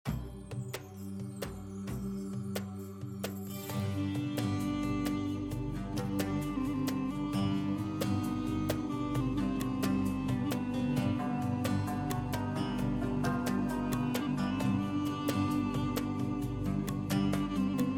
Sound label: BGM Nile River